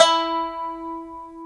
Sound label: KOTO E3, ETHNIC